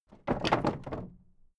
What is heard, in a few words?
old wood case wobble